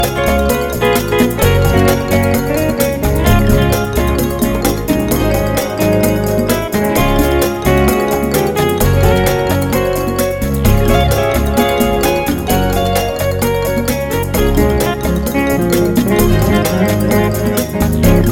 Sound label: A Hot Cha Cha